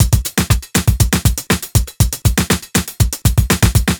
120 beat full, Nu Jazz House; PowerFX

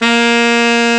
SAX A#2; AKAI S-Series CD-ROM Sound Library VOL-8

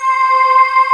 NOISE VOX; AKAI S6000 CD-ROM - Volume 1; SAMPLE WAVE MIXING 1

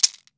maracas; AKAI S-Series CD-ROM Sound Library VOL-5

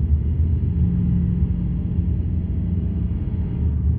sparse soundscape samples; ReLooped

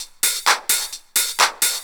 drum 130 hihat, Techno Trance, Drumloops

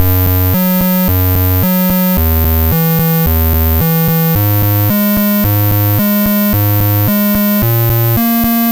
Apple II Bass, Chiptune

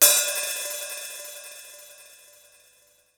HAT, Hip Hop; HipHop Kick Snr Hat; PlatinumPhattCD1; E-MU Producer Series Vol. 8 – Platinum Phatt

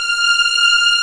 F6 LEG, String; East West; The Ultimate String Collection

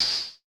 HH, Vermona Mono15 Drums

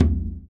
surdo; ILIO; Ethno Techno

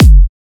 drum kick

